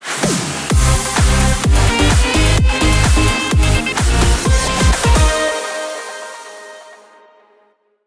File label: JINGLE; RADIO CHABLAIS; SUN